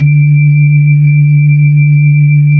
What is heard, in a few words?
B-3, Hollow Ch; Roland LCDP10 Keys of the 60s and 70s 2